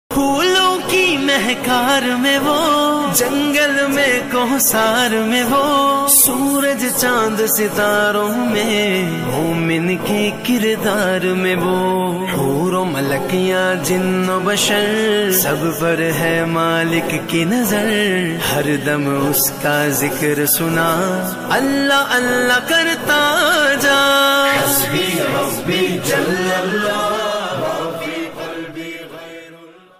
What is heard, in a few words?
Islamic Ringtone; Hasbi Rabbi JallAllah